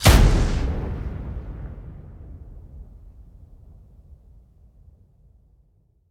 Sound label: AMR Fire, weapon